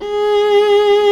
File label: G#3 solo viola; InVision Interactive; Lightware VOL-1; Instruments & Percussions